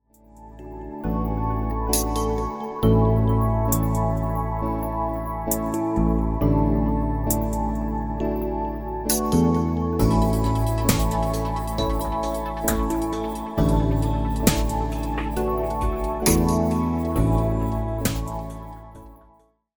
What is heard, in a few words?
sample simple synth